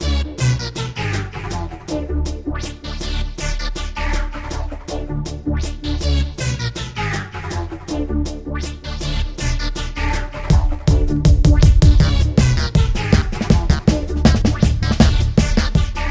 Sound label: Reusenoise DNB Mix